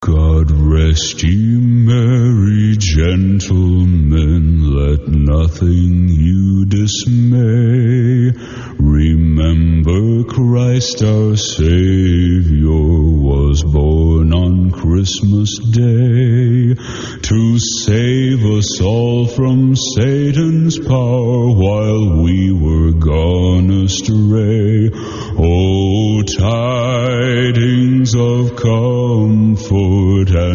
God Rest Ye Merry Gentlemen very deep male then female vocals